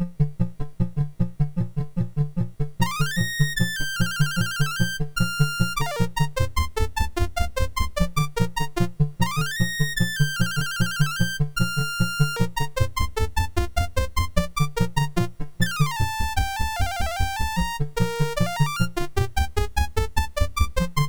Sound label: Chinese Dance, MOS6581R4